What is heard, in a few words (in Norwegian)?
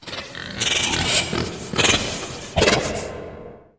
elektrogris